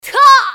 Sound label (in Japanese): マスケッティア女 攻撃